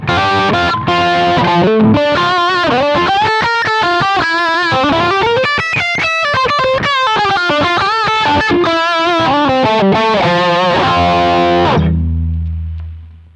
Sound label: drive